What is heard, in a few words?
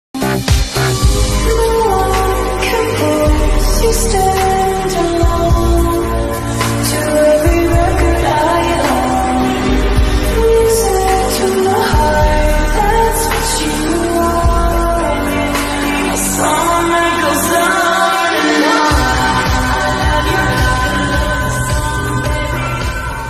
ringtone